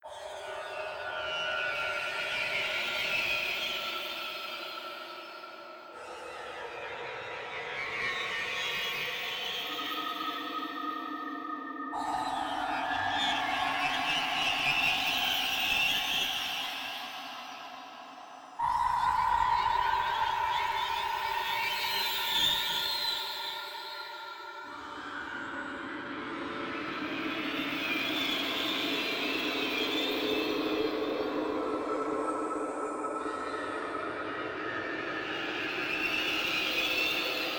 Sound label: creepy background